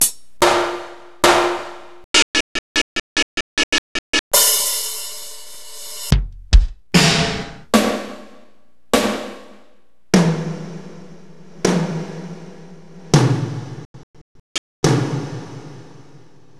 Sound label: Roland S-550 Stereo Drums